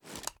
reload empty xxmag fast rattle; gmod; iw8; uzulu

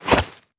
melee attack knife; viper

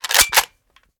reload empty charge; reloads; gmod; iw8

